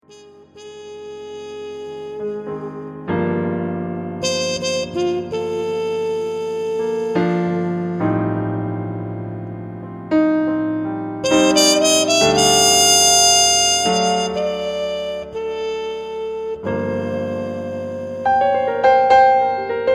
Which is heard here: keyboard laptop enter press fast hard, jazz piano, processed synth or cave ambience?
jazz piano